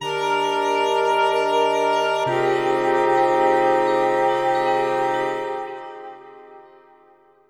synth pad ambient 160 C; Sphere

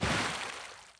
wet step; DAoC

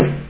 drum lo; drum samples

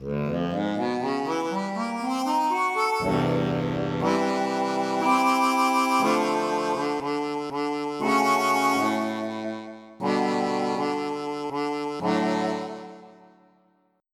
Vibrato Wet, harmonis

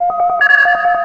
robot idle; Earth and Beyond; EA Games

